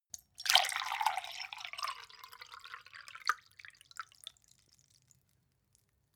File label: glass pour bottle hard; berufe; gastronomie; soundpool